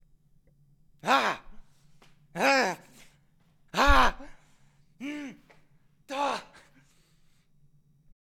Frustrated Grin

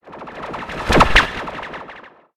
Wire Swoosh

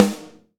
Snare

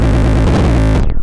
Glitch

